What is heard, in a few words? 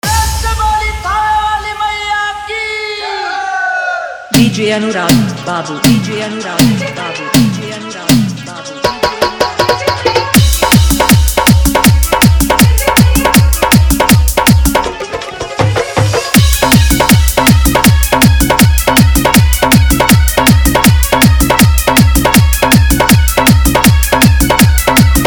Bhojpuri Bhakti Mata Bhajan Full Dhollki Bass; Dance Mix